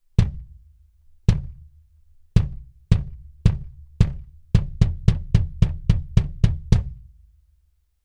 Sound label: bass drum dtp640 rex dynamic; lewitt